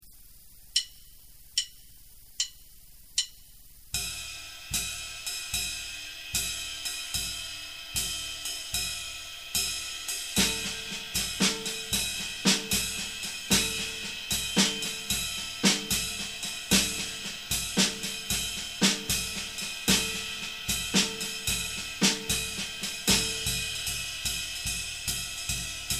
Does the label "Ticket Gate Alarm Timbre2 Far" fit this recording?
No